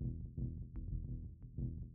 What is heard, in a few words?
bass, Rumbles